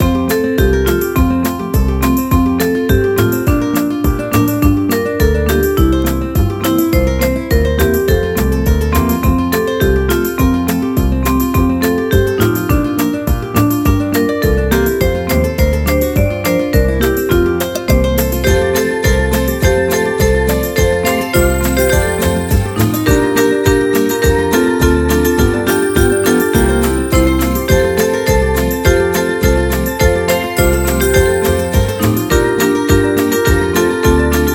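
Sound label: Music Jingle Bell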